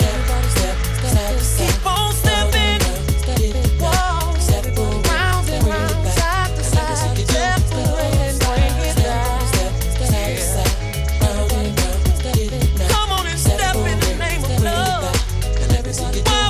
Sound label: funk; multitrax